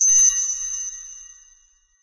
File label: amb random tech; source engine; portal2